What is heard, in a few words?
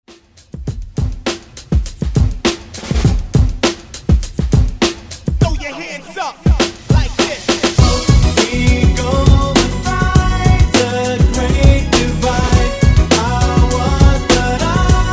90s ReDrum 101 Bpm; remixplanet